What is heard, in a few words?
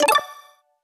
UI Scifi Tech Notification; Tom Winandy SFX